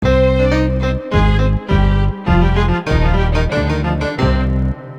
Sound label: Level Lost